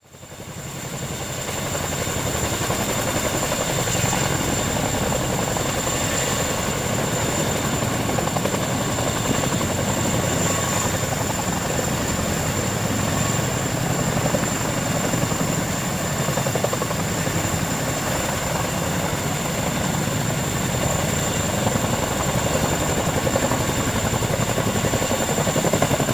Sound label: three Chinook helicopters hovering above the athletic fields